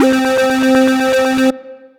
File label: Synth Lead; Motion Sickness; Ableton; Glitch and Wash